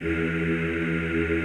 EEH E1, Classical Choir; Best Service; Extended Classical Choir

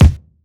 Dance Drums

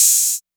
Open Hat, Roland TR-808; Roland MV8800; TR-808mk2

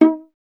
STR VLA, Solo Instruments

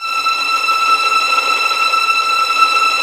STR VLN TR, Vlns Tremolo; Roland - String Master Series

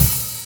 OHAT; East West; Funky Ass Loops

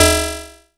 BRIGHT, PERC HITS; Zero-G Ambient 1

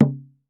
COCHITI, American Natives; E-MU Formula 4000 Series Vol. 4; Earth Tones

